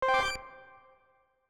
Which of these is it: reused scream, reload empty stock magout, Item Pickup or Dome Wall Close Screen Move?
Item Pickup